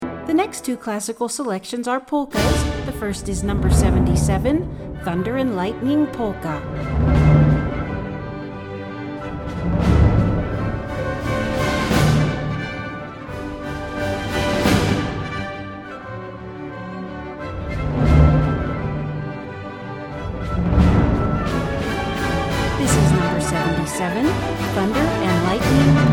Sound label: Polka; Thunder And Lightning Polka